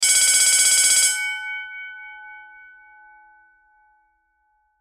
School Bell Ring, Foley School Bell Ring; Schools

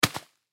Sand Bag Drop, Foley Sand Bag Drop On Cement; Playgrounds (Sound Effects:Backgrounds); Blastwave

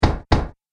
Arcade Game Dull Bang Sound; Video Game Sound Effects; Retro Inspired Sound Effects - Think Nintendo, Atari, C64

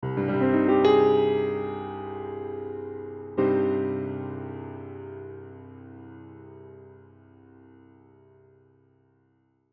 Piano Melody Minor; Musical; Blastwave

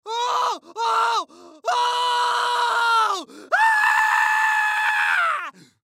Human Scream; Scream Multiple Long Male Fifty Yrs; Human Scream Multiple Long Scared Male Fifty Year Old; Screaming

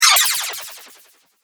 Ice Spell; Ice Spell - Master Magician; Magic Sound Effects; fantasy sound effect

